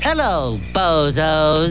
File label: pain; alienarena; johnny5